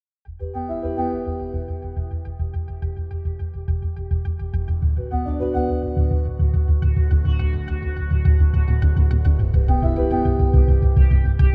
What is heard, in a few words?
Streaming Through Space Synths